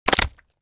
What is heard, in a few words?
Paintball gun impact object splat; sport